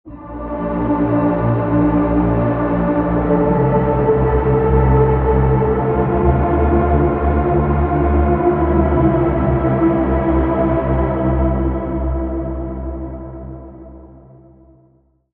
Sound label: Pad; Latent Peril; Routed Sound; Vital Expansion - Quantum Enforcer Vol.1